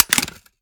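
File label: reload fast mag out, shotgun; reloads; iw8; romeo870; gmod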